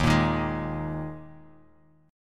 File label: Dsharp Suspended 4th; strummed; chord; 11,13,13,13; JGuitar